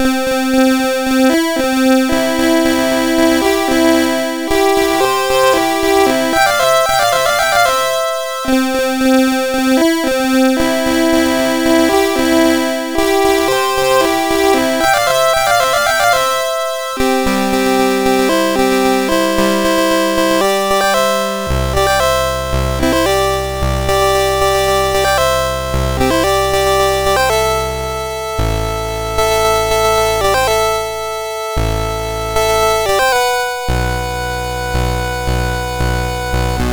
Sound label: Fanfare, MOS6581R3; Paul Labeaud